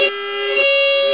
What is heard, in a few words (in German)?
sirene europe; Traffic; World Racing 2; TRmotoren